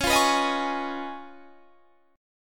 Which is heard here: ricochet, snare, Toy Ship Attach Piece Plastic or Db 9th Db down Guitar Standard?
Db 9th Db down Guitar Standard